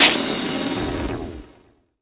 NOIS; XXL 800 Production Effects

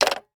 Telephone Rotary Receiver Down; Communications; 1001 Sound Effects

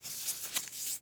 Tongue Depressor; Medical; Blastwave

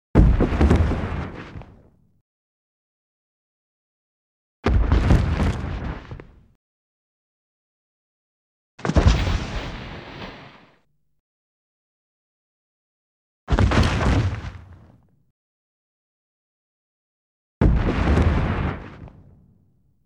Dragon Falls, Various Surfaces; Sound Ideas; Series 2000

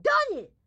grandma short yell; Blind Drive

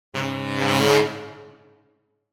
Horn Section Criminal Increasing Lower; Hollywood Edge; sound designer's toolkit v2

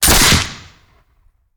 Musket; Sound Ideas; Guns

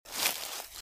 Footsteps - Bush walk - Left; Eden; RadwinIsland

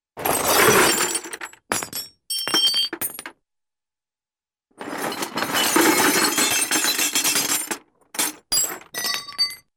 Nuts & Bolts Metallic Clatters, cartoon; Hollywood Edge; Cartoon Trax

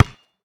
Street Ball Bounce Concrete; SFX Kit; Street Basketball

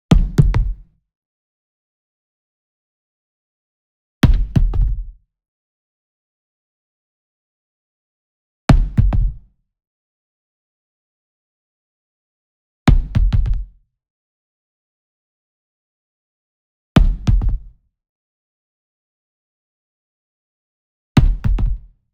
Sweetener Wood Mallet Bounce Hit Near; boom library; Cinematic Strikes Construction Kit

